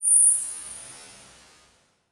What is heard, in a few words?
Beep Long